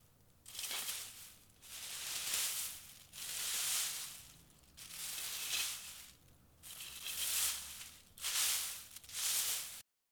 Raking Leaves

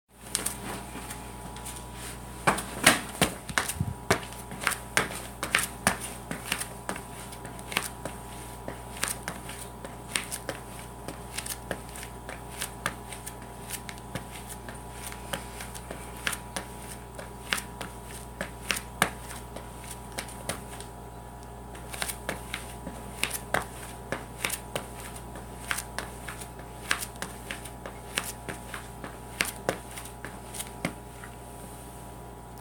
Crocs walk

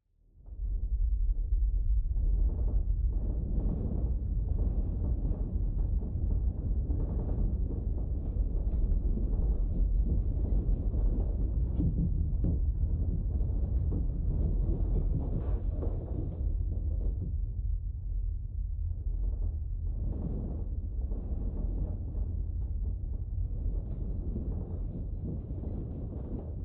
Earthquake

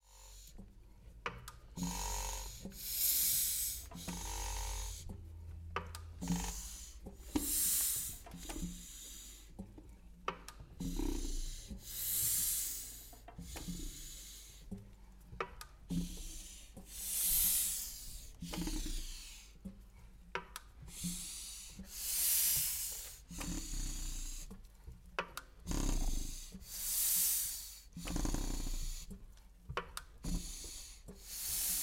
Motor Pump; Ingeos

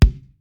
Kick

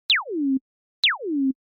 Laser Sound

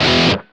Dist Guitar Power E Short

